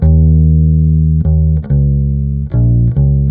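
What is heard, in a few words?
cardioid bass; Mystery Cardioid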